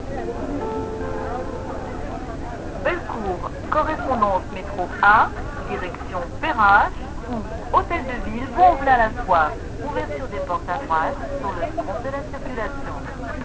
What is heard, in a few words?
lyon, bus